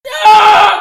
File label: scream